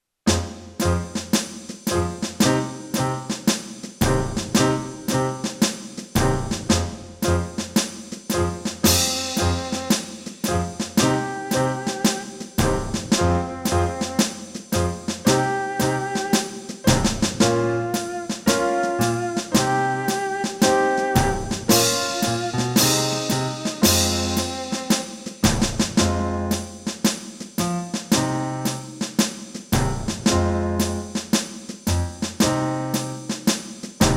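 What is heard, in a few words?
On Parade in Eb, backing tracks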